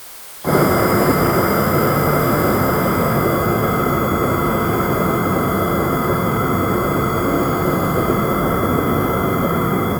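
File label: quiet plasma torch flaming, burning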